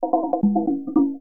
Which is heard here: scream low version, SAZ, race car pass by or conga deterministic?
conga deterministic